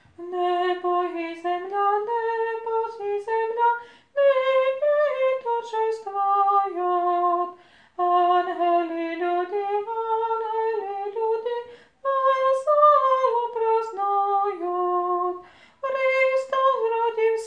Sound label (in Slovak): nebo i zemlja sopran